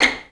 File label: VI PIZZ C6; Best Service; Peter Siedlaczek's Advanced Orchestra